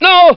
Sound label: hos pain, hostage